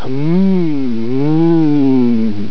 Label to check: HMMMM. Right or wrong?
Right